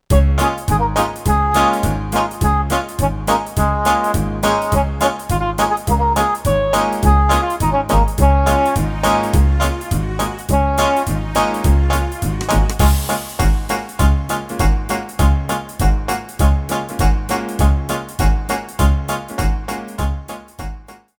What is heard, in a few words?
karaoke base backing track